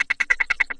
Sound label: 150 evil bug rattle, drum loop; 141 to 160 bpm